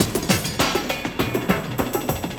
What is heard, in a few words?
LOOP, Drum & Bass, 100 BPM